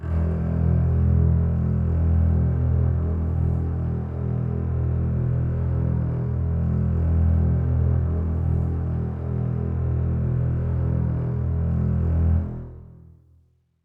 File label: Drone; Drones